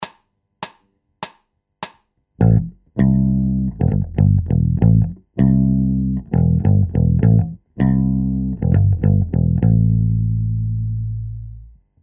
Bass Loops

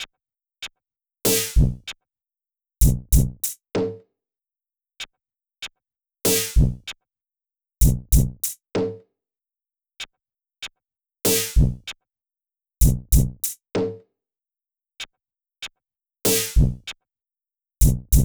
drums 96bpm

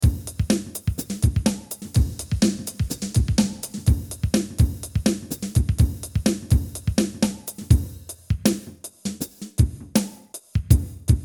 Drum Kit; Mixter Plus

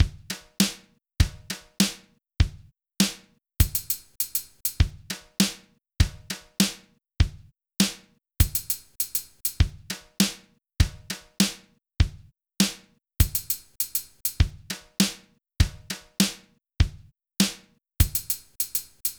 drum loop 100bpm

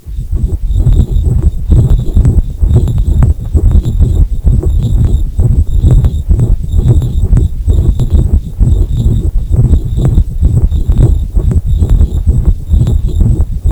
120 Noises Ambient Samples GTR HITS

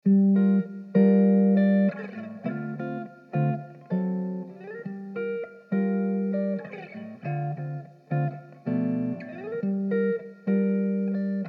Dm100 Jazzy GTR chords

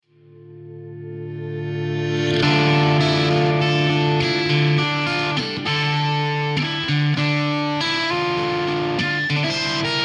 Dm 100 GTR Reverse Riff

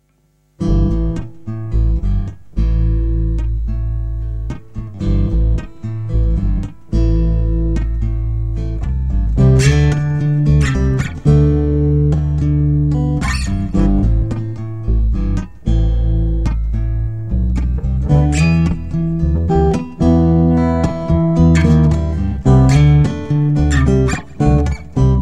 Blues for Birds without Wings (Duet)

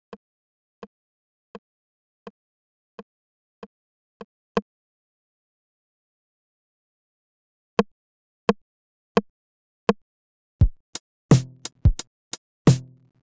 808 drum; The Rising Practise

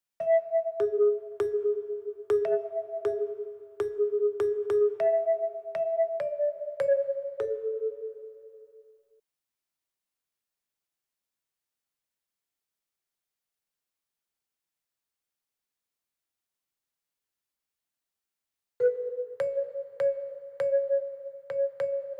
marimba A 100bpm

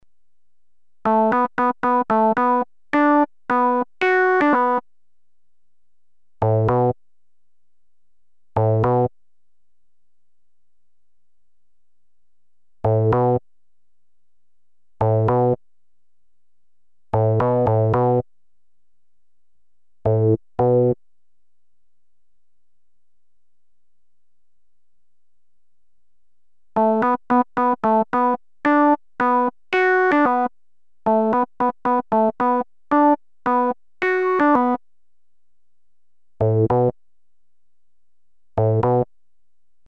piano

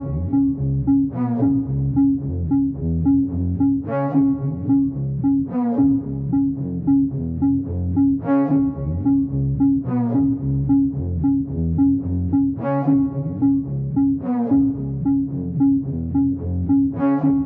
synth

